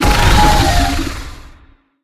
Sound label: Projectile Visage; smite; izanami